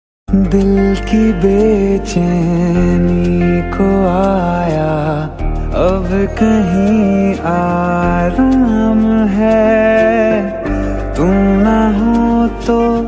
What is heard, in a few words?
love ringtone hindi ringtones mobile ringtones flute ringtone